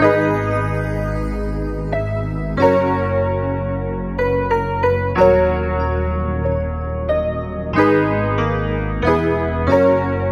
piano, sad loop

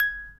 music box; Bells

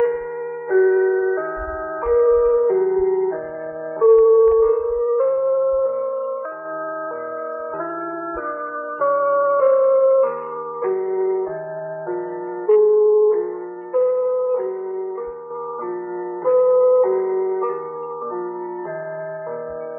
keys, sad loops

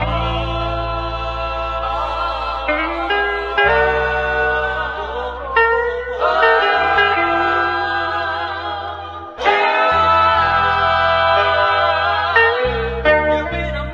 voice guitar, Phonky loops

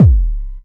Kicks

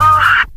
"uh" orgasm

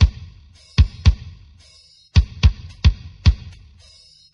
Drum loops

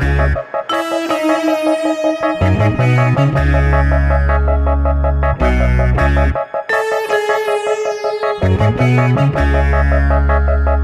keys and guitar, Phonky loops

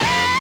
"ah", SUNG; ooh ahh

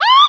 scream

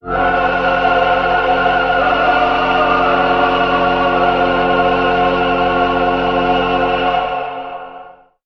voice choir finisher; Loops; Phonky

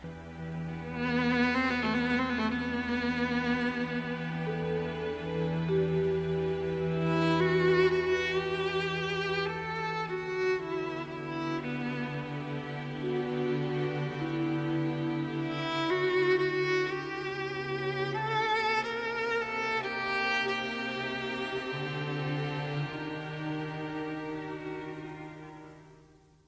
vile violent violin

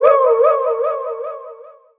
"woo", voice

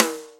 ringer, snares; Real

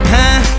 "huh"

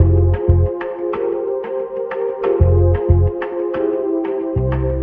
keys mystery box; loops

